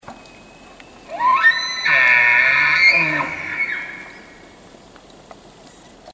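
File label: bugle demanding, elk; Challenge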